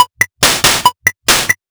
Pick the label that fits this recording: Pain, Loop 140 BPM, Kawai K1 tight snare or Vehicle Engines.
Loop 140 BPM